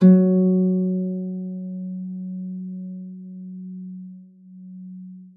HARP PIANO F3; Xsample VOL-8

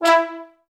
TB STAC E3; Best Service; Peter Siedlaczek's Advanced Orchestra

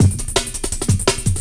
breaks no quantisation, JUNGLE; M8JUNGLEPAK1

